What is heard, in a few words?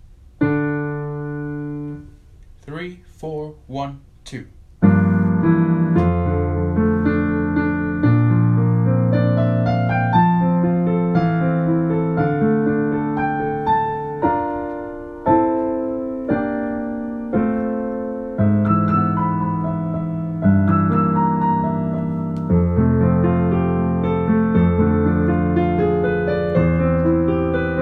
Accompaniment